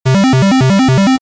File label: arcade game alarm short